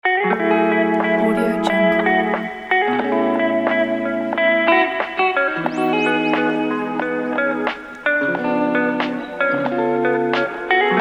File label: neo soul rb modern music